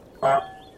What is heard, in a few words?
honk glass